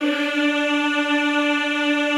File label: D3, Classical Choir; Best Service; Extended Classical Choir